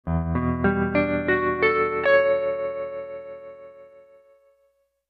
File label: Music Logo Old Weste, guitar